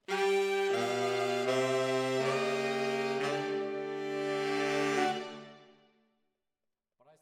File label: ORTF SCHOEPS, piano